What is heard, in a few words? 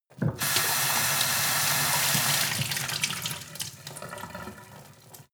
sink water pot hard; industrie-wirtschaft; gastronomie